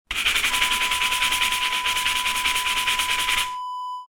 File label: scrubbing surface